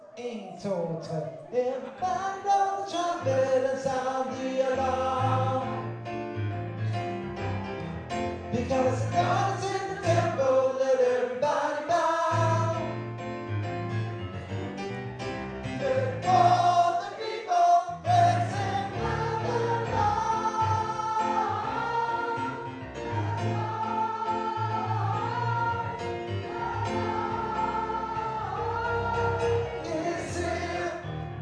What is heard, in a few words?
tenor; kor